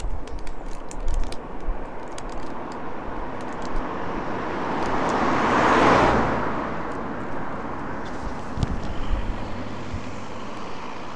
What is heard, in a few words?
Dusk birdsong on walk home, soundscape only, birds, traffic